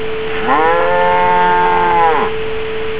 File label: cow interf